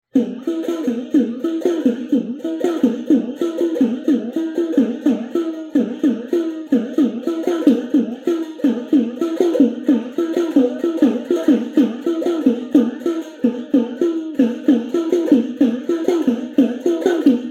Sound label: gopichand